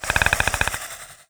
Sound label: warning, antlion; creatures; vox